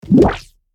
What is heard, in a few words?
Casual mobile game menu ui tab switch